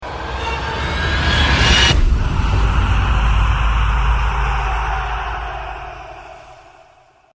scary

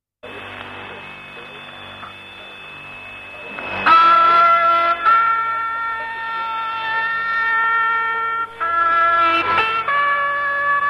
bugle; irdial

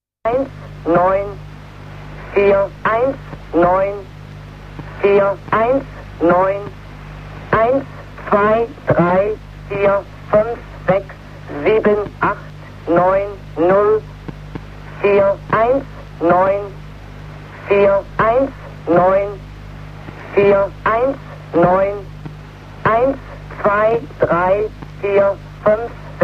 rough tones; irdial

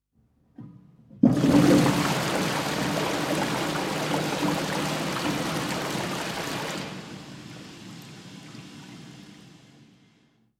Mega Flush

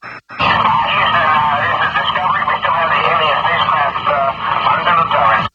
ufo

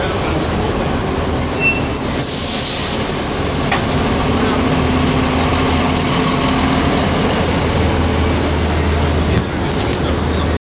Squeaky Idling Bus

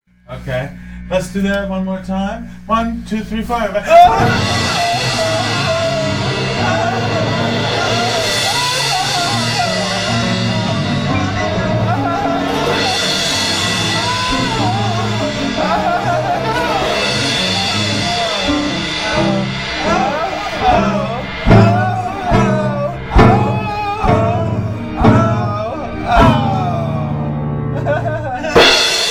Piano Bench Breaks

